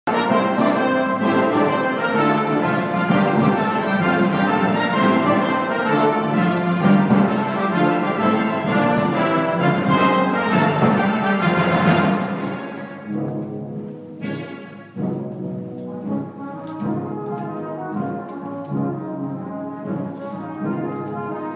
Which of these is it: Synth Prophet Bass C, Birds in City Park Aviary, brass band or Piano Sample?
brass band